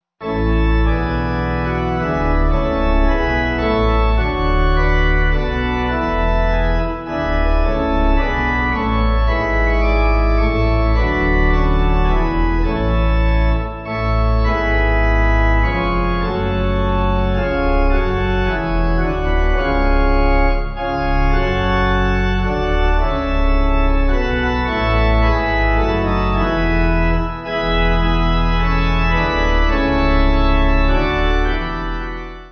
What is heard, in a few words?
pipe, Free Music Backing Files for Churches